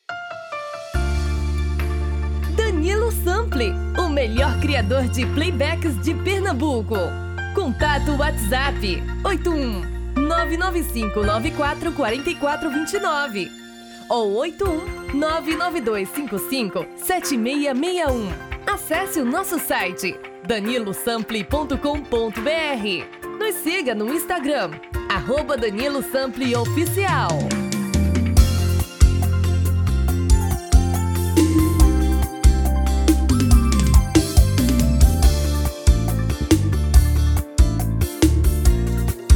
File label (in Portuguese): Brega